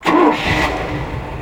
boat engine start

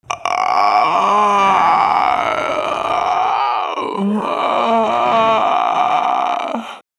female zombie sighted; nmrih; zerosiki; slender

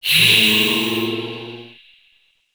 stun; wicked; slender

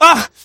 pain sharp; scout

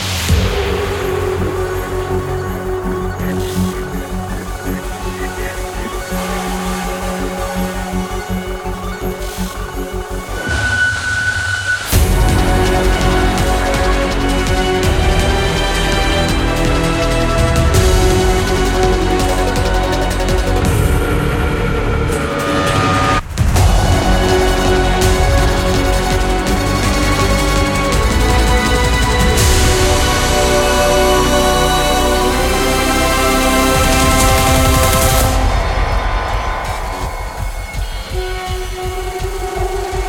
loop, wave music; zombiesurvival

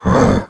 attack, male zombie; nmrih; zerosiki; slender

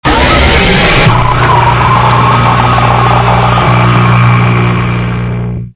jumpscare; zerosiki; eltra; maria

